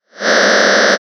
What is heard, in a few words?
cluster closed quarter F